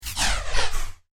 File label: Human Bazooka, cannon sound effect; Conflict Earth - Human Bazooka; science fiction sound effect